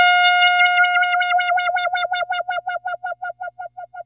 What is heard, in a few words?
2600 F5, ARP 2600; Hollywood Edge - Giorgio Moroder Rare Synthesizer Collection